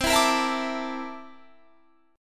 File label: C 13th C down Guitar Standard; C13 strummed